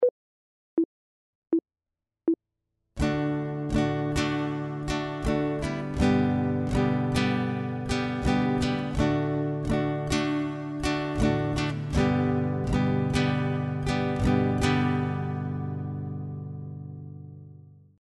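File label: chord, guitar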